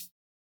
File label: no effect j city pop 1980 hihat